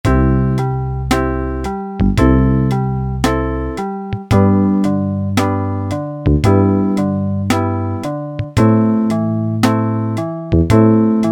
SLOW ROCK, Yamaha SHS-10 Factory style demos

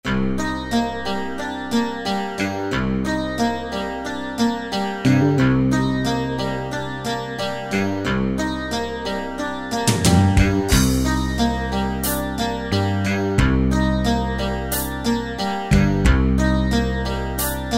Hard Rock Ballad, Yamaha QY70; Preset Styles

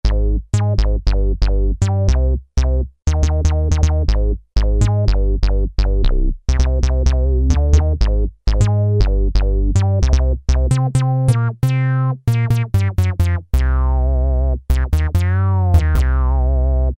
HOUSE BASS

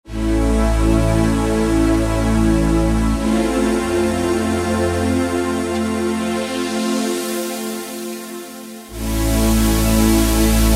Killer Pad, Roland JD-990; Factory Patches

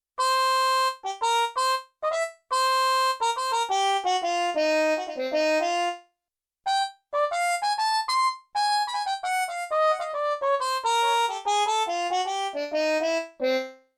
Muted Trumpet; Yamaha TX16W; Big Band Performance

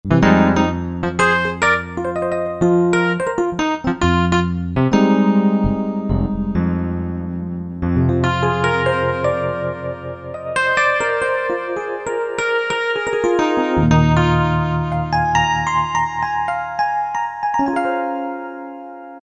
Suitcase 88, Alesis Z4 Vintage Keyboards

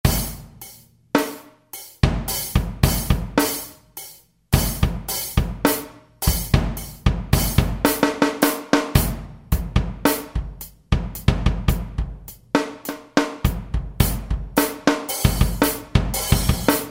Ambient Kit, Real Drums; Yamaha A4000; Kits

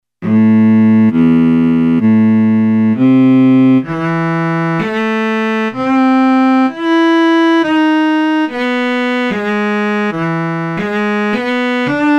Solo Cello

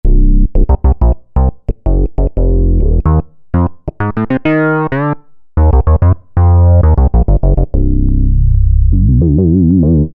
Bass Closet Bass, Alesis Micron; Factory Programs

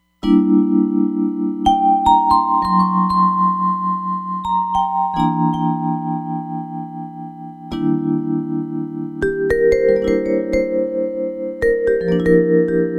Vibrations, Roland Fantom XR

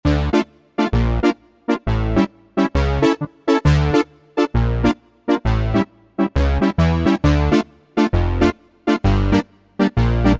Thick Brass, Roland V-Synth; Factory Patches

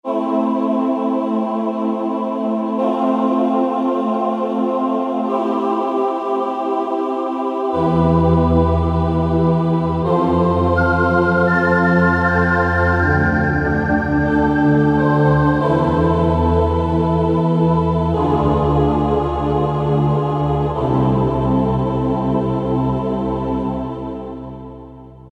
Assembly, Generalmusic S2R Performance

